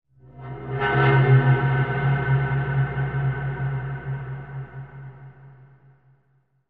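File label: Dry Ice Eerie Vibrato, dry ice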